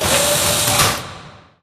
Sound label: motel wall collapse; Source Engine; Portal 2